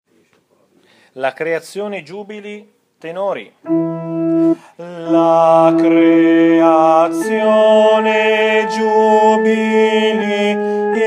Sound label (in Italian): La Creazione TENORI